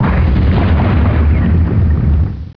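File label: EXPLODE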